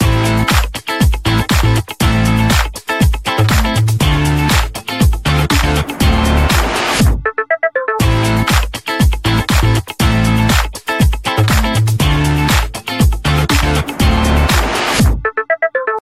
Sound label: RingTone